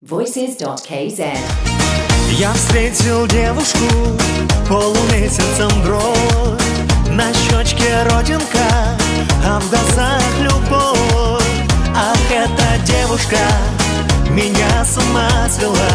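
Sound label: Russian vocal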